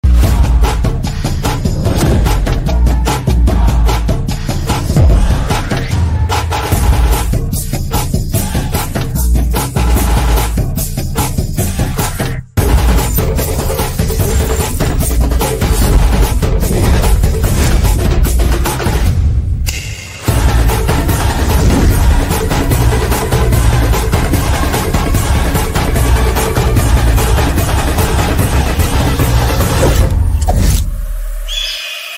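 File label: background music; Vettaiyan; Rajinikanth; Fahadh Faasil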